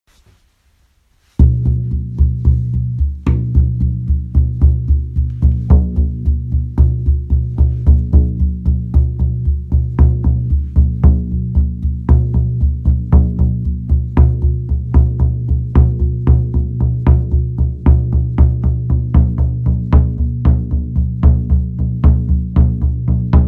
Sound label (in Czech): samansky buben nove generace veganska verze